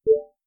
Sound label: completion success; stereo; ocean